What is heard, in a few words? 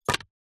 wood small thin piece set down; foley; zapsplat